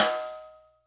marimba drum; ゆめ2っき

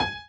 marimba; ゆめ2っき

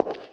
ashioto room big

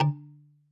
marimba kalimba; ゆめ2っき

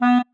marimba penguin; ゆめ2っき

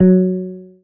marimba guitar

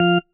marimba tissue; ゆめ2っき

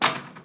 close; ゆめ2っき

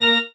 marimba grave; ゆめ2っき

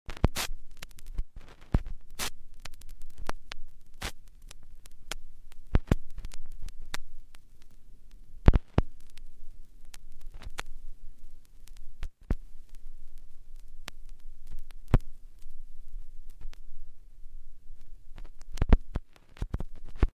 noise record

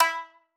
marimba shamisen; ゆめ2っき

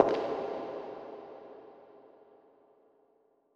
ashioto plate long

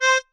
marimba chainsaw; ゆめ2っき

